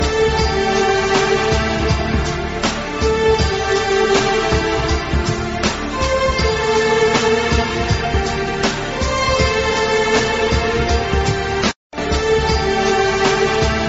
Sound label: english sweet ringtone